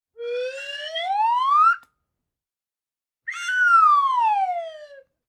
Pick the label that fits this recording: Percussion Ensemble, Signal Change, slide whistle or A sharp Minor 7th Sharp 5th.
slide whistle